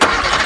coin; SRALLY

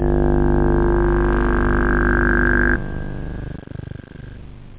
ORGAN; da capo vol1; instruments

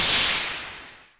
land mesh; ambient

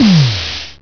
fireball

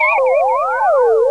space loop; 7invasores

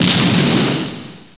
explosion; environ; Gunlok

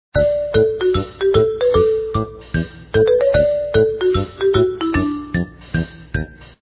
bgm; POKEMON; pippi

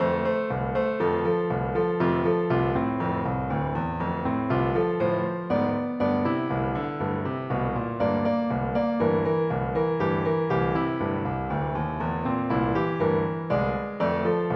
polka

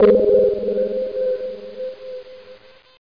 goast

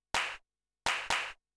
CLAP HOUSE 125; eJay; Drum

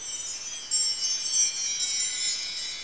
chim

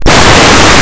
door; Blue Heat

